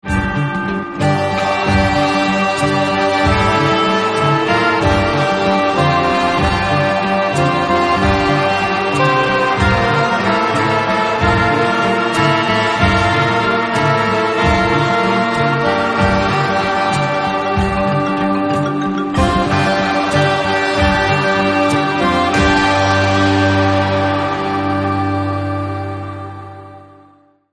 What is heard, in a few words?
Bell; Cantos